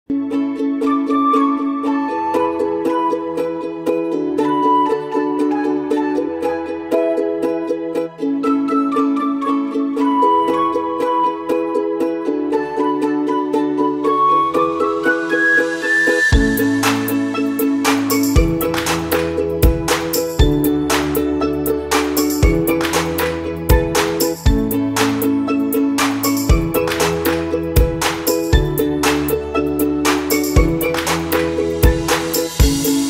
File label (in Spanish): Musica Fondo